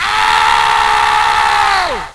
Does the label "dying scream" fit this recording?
Yes